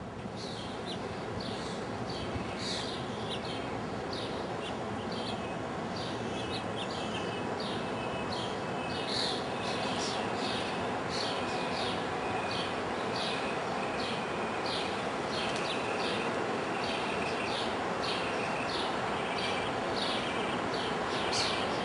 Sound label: house finch